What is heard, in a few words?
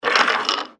dice